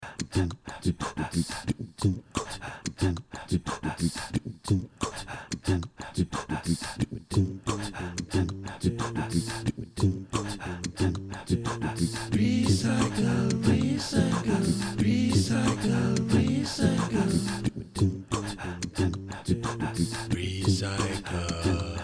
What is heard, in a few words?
recycle voice; Levende Musik i Skolen; Rytmer og lyde findes overalt hvor vi færdes i hverdagen